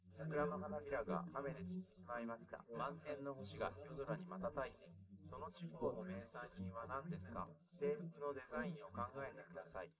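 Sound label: male, live recording 130ms 1m; SiSEC11; underdetermined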